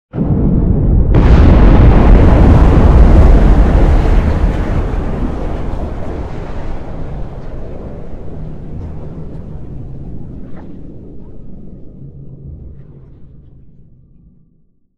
fusion explosion; Weapons; EK modern; Barotrauma

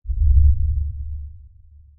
sonar Ping Air Medium Beacon; Barotrauma; Real Sonar

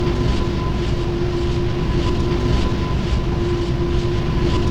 pump; Barotrauma; Subsurface

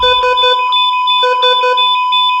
flatline; Barotrauma; Neurotrauma

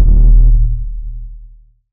sonar Tail Suit Very Close Beacon; Barotrauma; Real Sonar

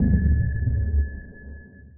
sonar Tail Suit Medium Shuttle Directional; Barotrauma; Real Sonar

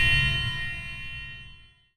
sonar Tail Water Medium Beacon Directional; Barotrauma; Real Sonar

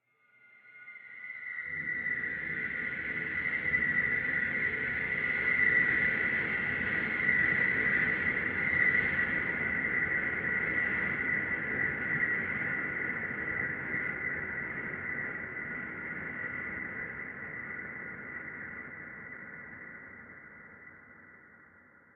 spawn; Barotraumatic; Crook

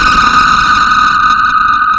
sonar Tail Water Very Close Beacon; Barotrauma; Real Sonar

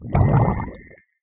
Drown, Water; Barotrauma; Subsurface

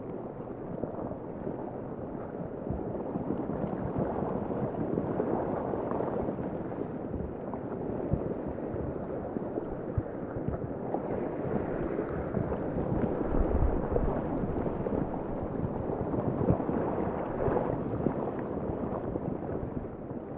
Water Ambience; Barotrauma; Subsurface

